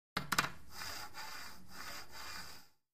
Billiards Bridge

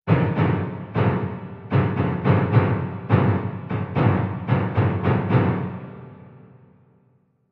Music Piano B Horror

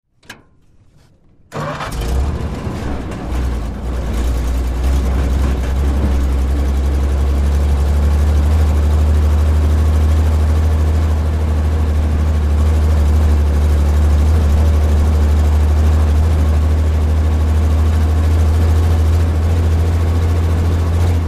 Truck 2.5 T Int Medium; 2.5 ton

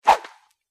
Thick Cable Swishes

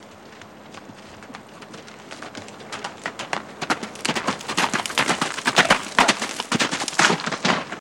Horse Gallop In Stop

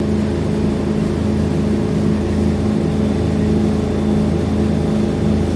V6 Chris Craft Boat Dr, boat engine

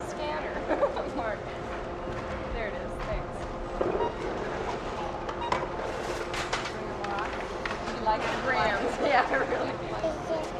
Grocery Store Background at Checkout

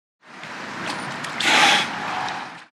Dolphin Blowhole

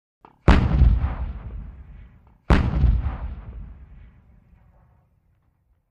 105mm Howitzer: Fires, Close-up. Dual

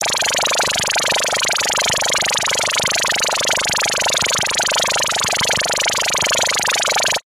raygun; rayguns

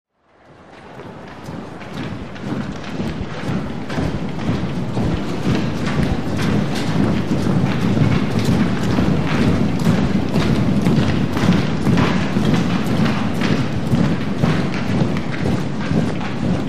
Players In Tunnel, arena foot stomps

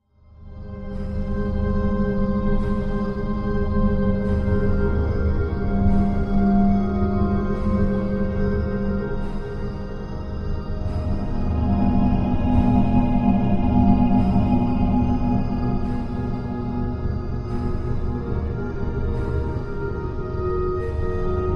Music Eerie Orchest; orchestral

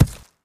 Concrete Chunk Drop; rocks & dirt

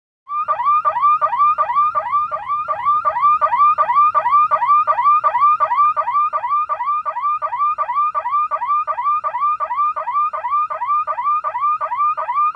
Car Alarm Rapid Highp; car alarms

